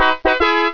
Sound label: Fanfare II; Snood 1.1